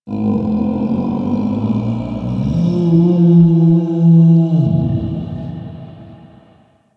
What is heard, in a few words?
Valley Night Distant Creature; Ambience; Warframe; Quests; The New War